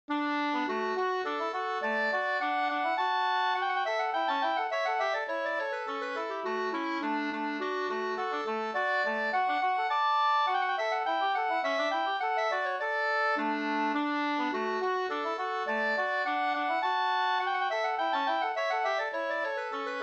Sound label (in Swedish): slangpolska, D dur